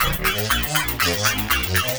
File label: 120BPM LOOPS; Bolder Sounds - Granular